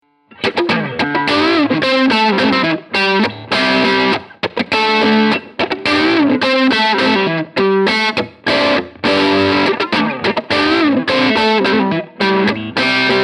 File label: Tone King Gremlin Tweed Crunch; 70th Anniversary